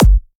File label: Dr Kick